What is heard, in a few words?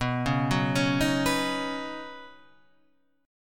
Db 9th Suspended 4th B